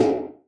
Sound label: hair dryer A1, Hair Dryer Drum Kit; UNUSUAL KITS and FX